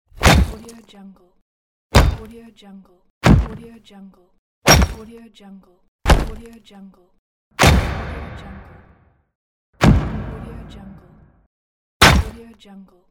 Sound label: rock impact; tibasound